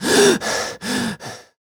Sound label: voc gasp male; oblivion; Realistic Fatigue